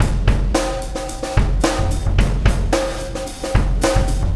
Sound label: Wormhole Beat, Processed Drumkits